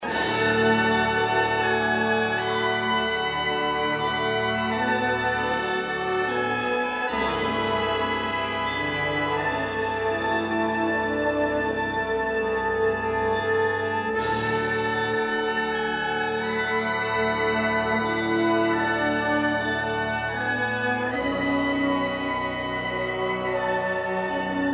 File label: Symphonic band; Song of the Sea